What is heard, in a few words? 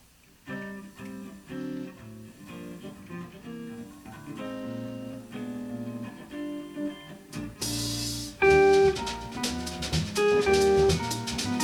samba